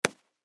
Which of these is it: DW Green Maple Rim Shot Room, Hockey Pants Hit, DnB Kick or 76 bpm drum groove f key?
Hockey Pants Hit